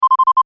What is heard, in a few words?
Science Fiction Beeps Chime; Tech